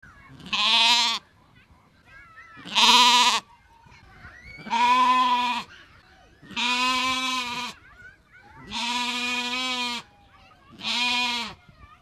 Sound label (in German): Schaf